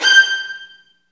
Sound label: STR VNB2, Vlns Marc; Roland L-CD702; Vlns Marc1-3